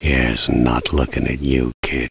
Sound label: Cheer; svencoop; voice commands